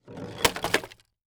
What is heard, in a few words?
Crash Wood Chair Piece Slide Down Wood Into Wood Debris; crashes; AdobeCC